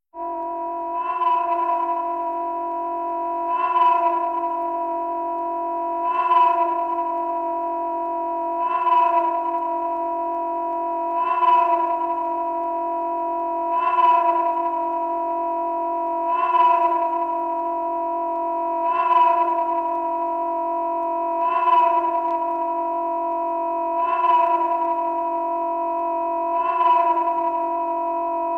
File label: Alarm, science fiction effects; KMP Film Video Sound Effects